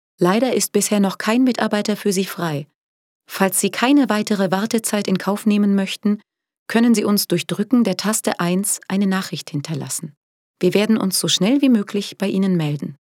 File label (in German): telefonpaket warteschleife